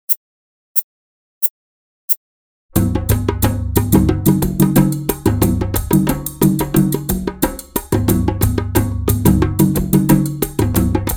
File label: Drum